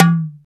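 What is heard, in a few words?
percussion tabla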